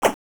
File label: Axe Chop